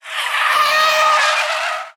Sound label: car braking suddenly